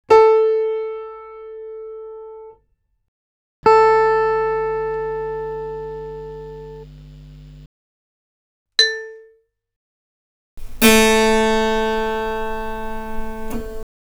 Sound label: piano guitar vibraphone harpsichord